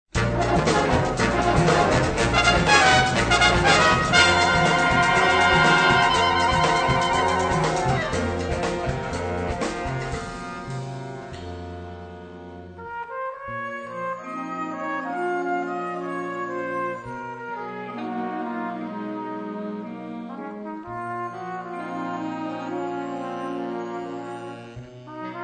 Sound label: Latin Impressions